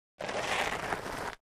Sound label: Object Dragged Acros, rocks & dirt